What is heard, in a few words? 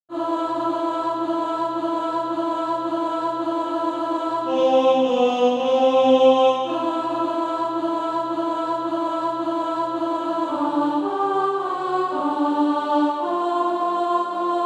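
alto, Practise Music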